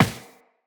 Step Default, Footsteps Walk; Tenno

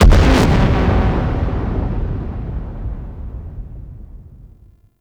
Capital Ship Destroy Explosion; Warframe; RailJack; Corpus; Corpus Capital Ships

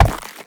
Step Ice; Warframe; Uriel

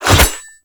Machete Whoosh; Kahl Machete; Warframe; Melee

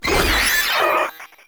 Maggot Attack; Enemies; Vocals; Warframe

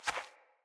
Rat Guide Step, Foley

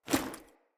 Foley Action Armour Melee Swipe; Player; Deora Armour

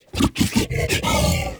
Juggernaut Found; Infested; Truffle Hunt

